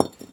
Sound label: Brick Drop Concrete